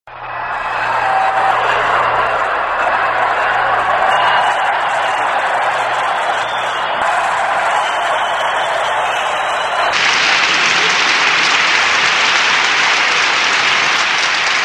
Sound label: Applause